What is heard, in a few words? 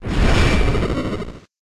Corkscrew Crash